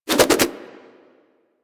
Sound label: Nunchuck Spin Whoosh C, Infested, Weapons; Warframe